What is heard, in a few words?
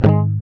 G#, Acoustic; Sound & Vision; Gigapack I CD 2; GUI ACOUST.; GUI Acoustic 1